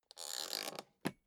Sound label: suitcase close latch lock really slow